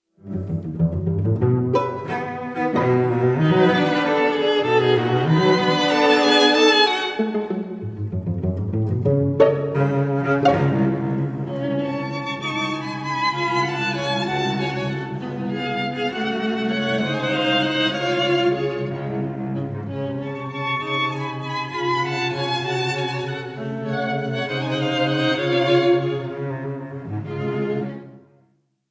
string quartet no 2 in a minor op 54 movement, string quartet; goldner string quartet; hamilton harty